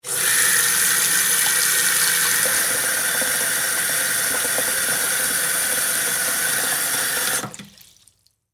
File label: Water Sink Fill With Water; Bathroom; Household